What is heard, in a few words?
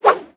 KNIFE BY; outlaws